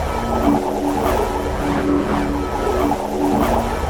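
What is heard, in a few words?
alteration travel loop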